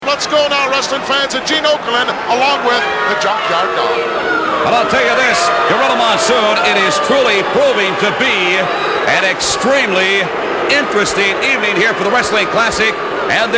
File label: boo; ritish bulldog